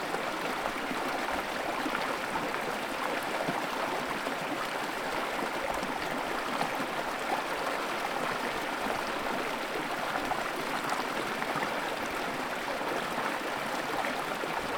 river

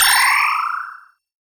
power

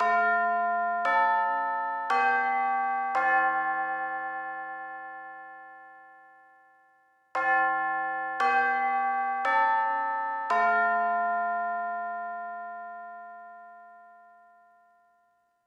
chime

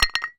stone

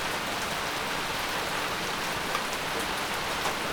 rain

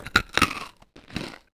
eat

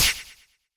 shoot

